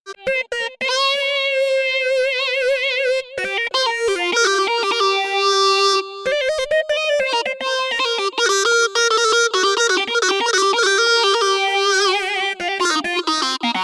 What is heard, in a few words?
LD Vox Box; Cypher2; Funkateer 2D by FXpansion